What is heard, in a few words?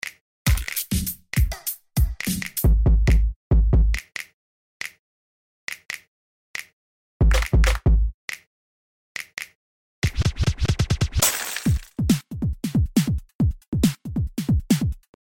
Bass Rap